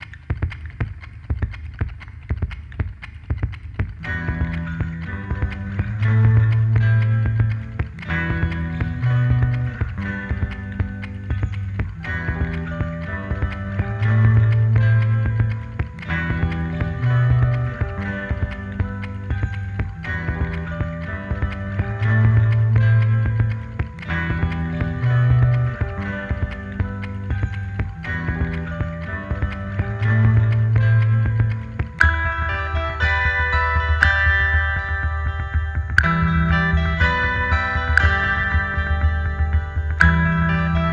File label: tempo looping